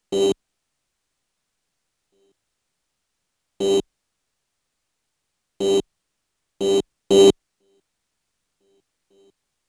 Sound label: 030bpm; elektron machinedrum